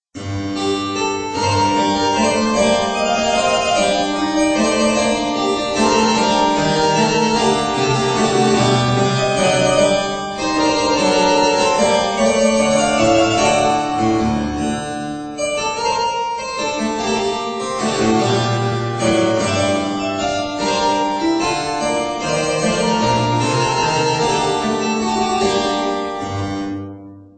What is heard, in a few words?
Gigue; Chambonnieres